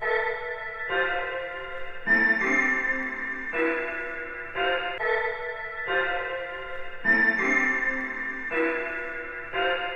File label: Fake loop 95BPM; radiosonics